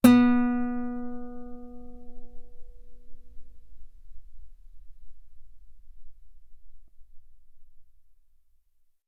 Guitar ff sul B B3

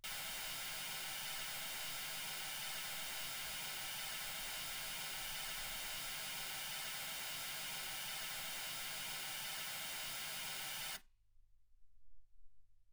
mls 48kHz

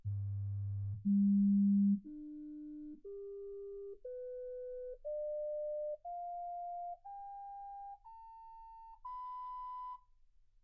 sinus tones 48kHz

